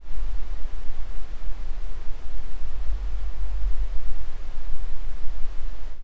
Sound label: earthquake maybe